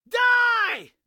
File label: die yell; mobs; mobs redo